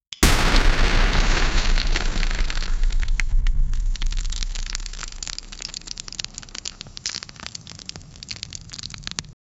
a star collapsing with

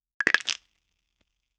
plop plop fruits dropping

